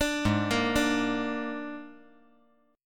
G sharp Suspended 2nd Flat 5th G sharp down, chord; G#sus2b5; arpeggiated; JGuitar